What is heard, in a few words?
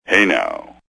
tambor